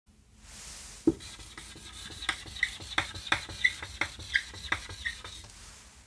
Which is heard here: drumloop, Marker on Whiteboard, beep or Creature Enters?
Marker on Whiteboard